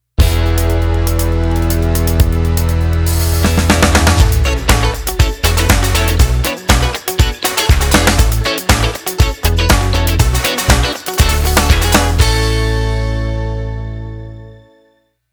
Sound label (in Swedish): JINGEL